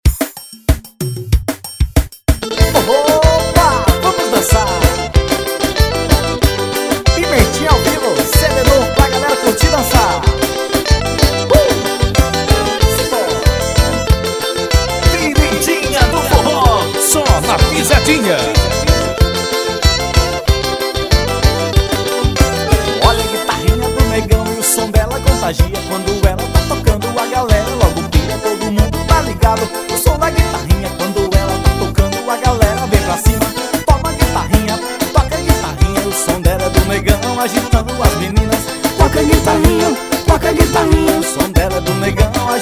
som da guitarrinha

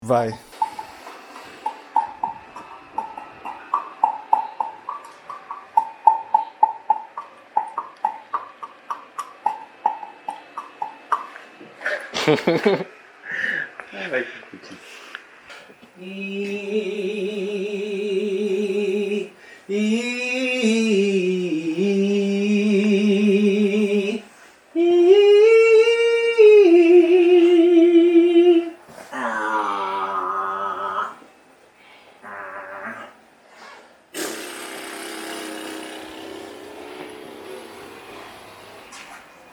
aquecimento ao vivo; estudio sangha

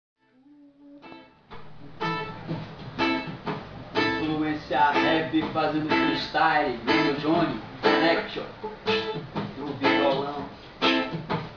beat box; atrapado atendendo porta

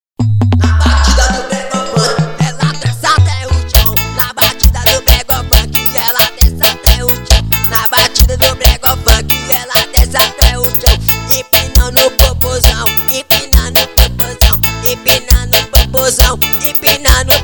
na batida do brega fank

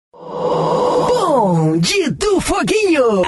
vinheta; foguinho

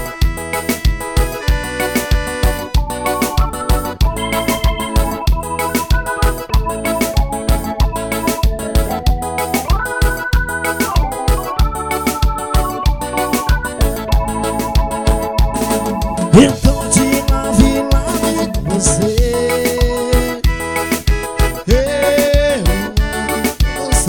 forró

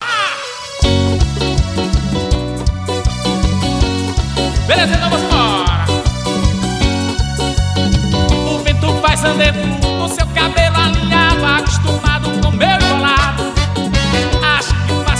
axe

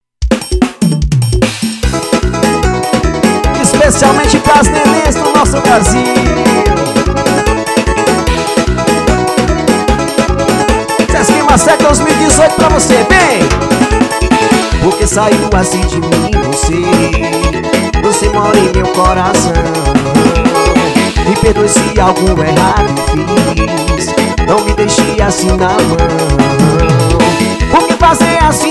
tocando todos os ritmos

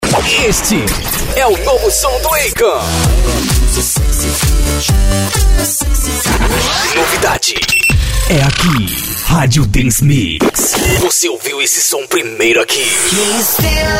vinhetão

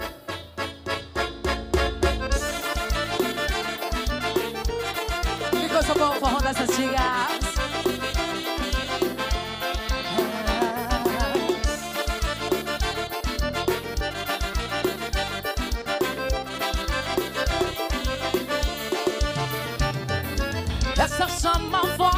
cantora

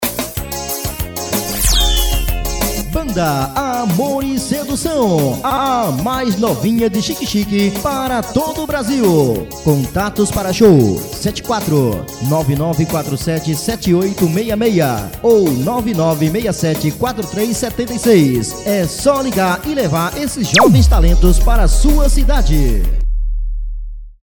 abertura

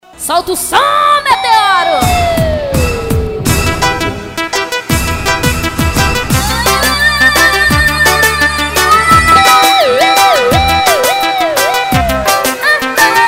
swing louco

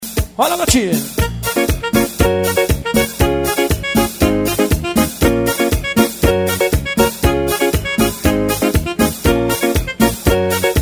carimbó

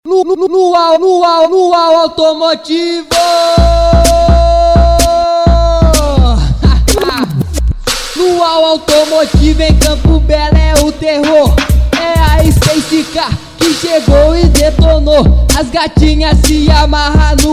automotivo

